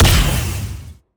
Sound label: attack light; bloom minigame